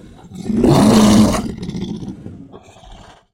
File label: krevetka death; mob